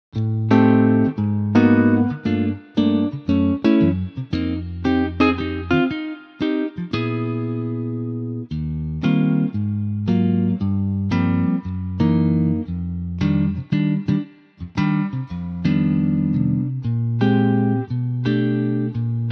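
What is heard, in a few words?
Eb Sunburst guitar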